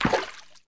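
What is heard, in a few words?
female water, footstep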